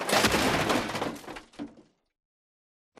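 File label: Stalker Nuke Destruct Explosion Metal Debris; Titanfall 2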